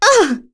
Damage, Vox; Yuria; kings raid; en

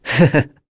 vox Laugh; kingsraid; voices heroes en

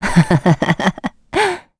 Vox Happy; en; voices; heroes; Hilda; kings raid

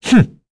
Vox Jump; Esker; kingsraid

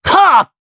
Vox Attack, jp; Esker; kingsraid

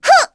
Vox Attack; kingsraid; en

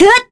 Attack; Scarlet; kingsraid; voices heroes

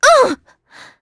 Vox Damage; kingsraid; voices heroes

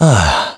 Vox Sigh; kingsraid; voices heroes en

